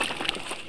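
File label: fish jump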